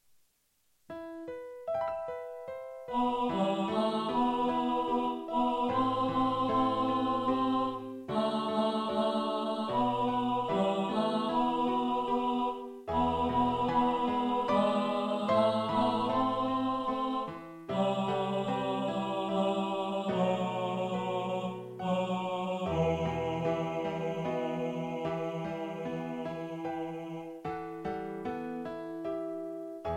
tenor, choir

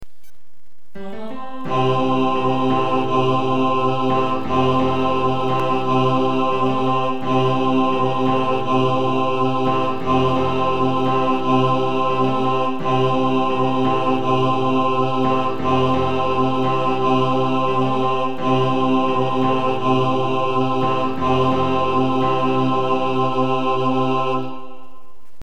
men, choir

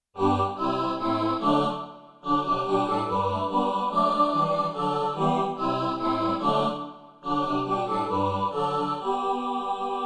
satb

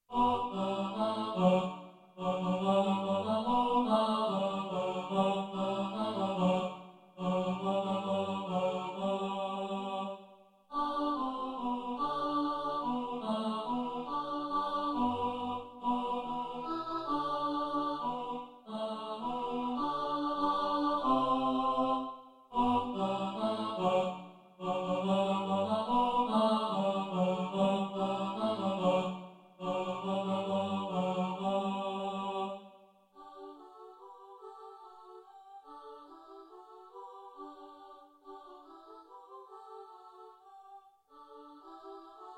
tenor, choir

